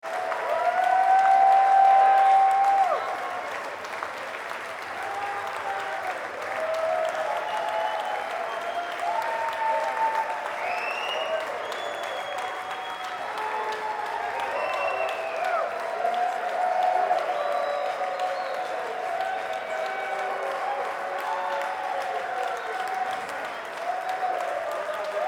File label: Rock Audience; Rock Concert Audience , Postshow , Large; Applause; Human